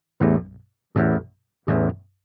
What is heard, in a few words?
staccato chords no processing